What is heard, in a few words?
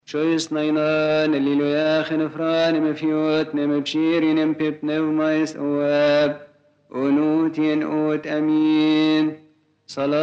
Coptic & Arabic, Hymns, Holy Week; Thok Teti Gom